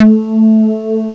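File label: bass synth, ANALOG; CMI Sound Library Rev 1.3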